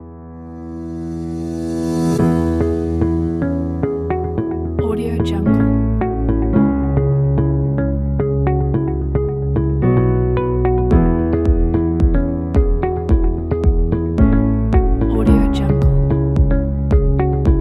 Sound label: ambient positive